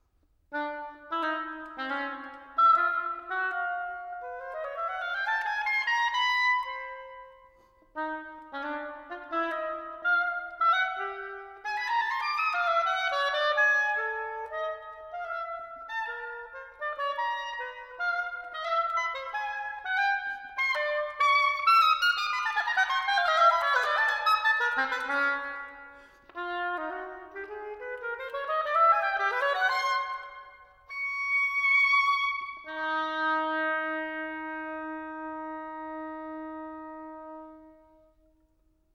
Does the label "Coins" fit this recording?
No